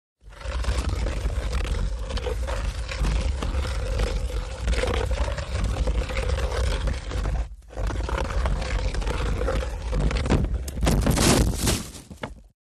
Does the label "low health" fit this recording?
No